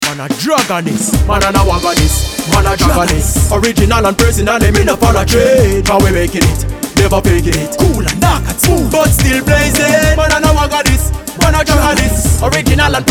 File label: Dragon Jingle